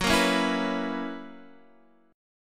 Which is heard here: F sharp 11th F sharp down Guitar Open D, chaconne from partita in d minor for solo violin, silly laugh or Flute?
F sharp 11th F sharp down Guitar Open D